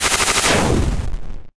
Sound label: freeze; CityHero